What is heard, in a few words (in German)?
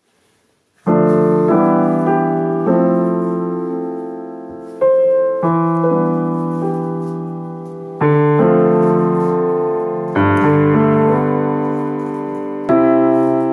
Gestimmt, piano